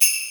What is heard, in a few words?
jinglebell